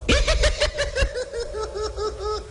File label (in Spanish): risa